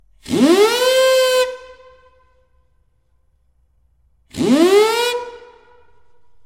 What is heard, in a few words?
Steam Siren; Foster